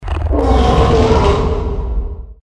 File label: roar; trogdor soundboard sfx